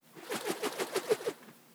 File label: Twirl, Organic Wooshes; Micro Pack